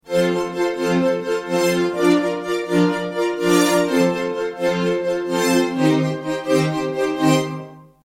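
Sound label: D-50 Strings; Strgs; dx7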